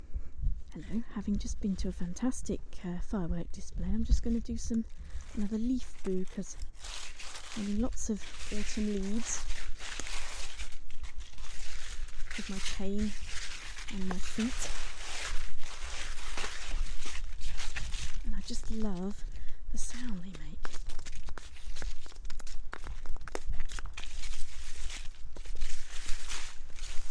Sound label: walk through autumn leaves with firework in background